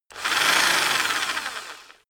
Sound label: rock drill rev low medium